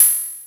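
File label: hi-hat open, EMU Orbit-3 drum machine; 300 Drum Machines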